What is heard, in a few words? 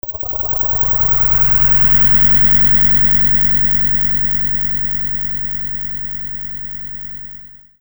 SCIFI